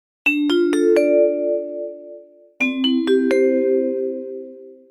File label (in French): Melodie Xylophone montee descente